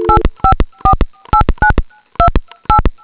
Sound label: dtmf L1